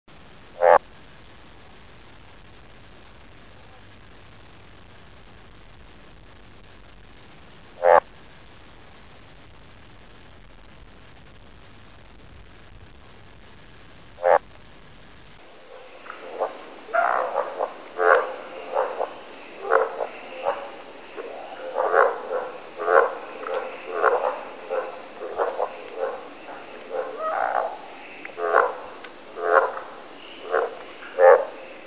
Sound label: frog, nature